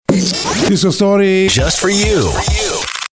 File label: Spot Disco Story